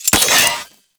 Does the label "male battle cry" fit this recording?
No